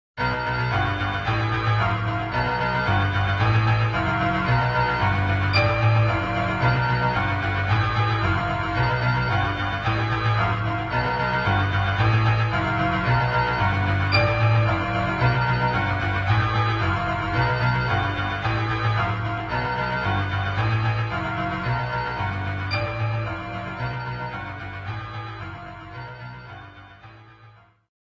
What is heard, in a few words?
Boss Fight; Cubivore original soundtrack